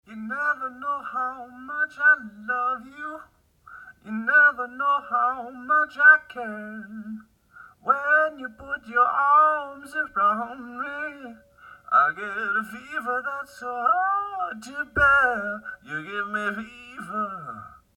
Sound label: Resonators Vocal Cat Dish Stereo